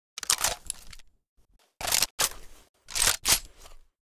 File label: rpk reload; STALKER